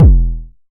small reverb kickdrum sample e key; kick drum samples

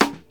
seventies crunchy acoustic snare sound D key; snare drum samples

